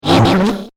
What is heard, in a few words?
Martian Engine Noise, alien sound effect; science fiction sound effect; Andrew Fleming